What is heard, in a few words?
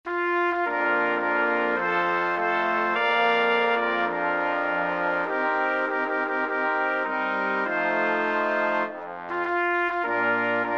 Brass Quintet; La Marseielaise